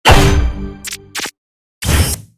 survival rank up sound

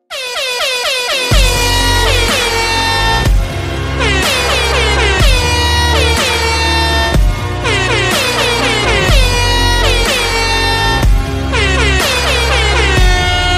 airhorn

